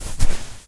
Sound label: footstep sand walk; MONO; ProSoundCollection v1 3